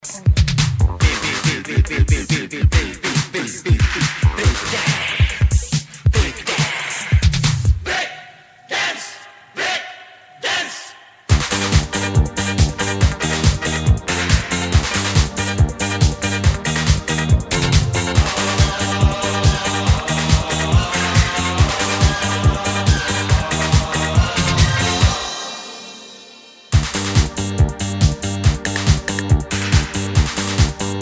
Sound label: Rock N Roll